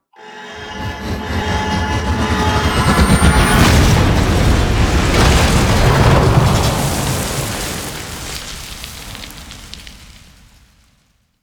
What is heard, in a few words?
Dropship Control Loss; Warframe; Veilbreaker; Devourer Kahl